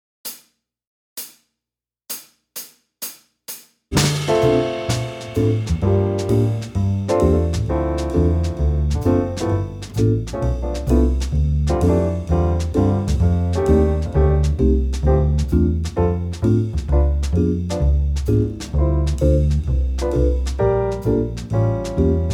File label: improv 130